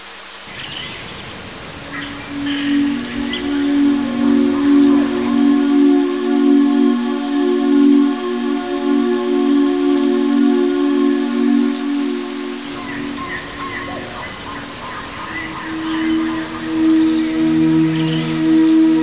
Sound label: mono 8bit